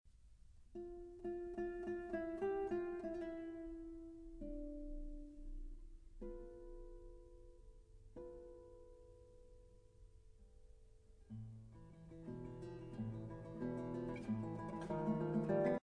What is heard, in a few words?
Notturno; Torroba; Recuerdos